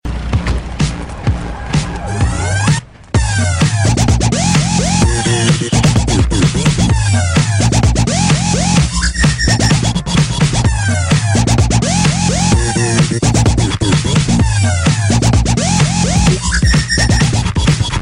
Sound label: Electronica